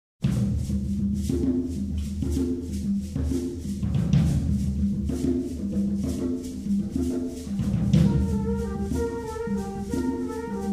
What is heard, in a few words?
African Bell Carol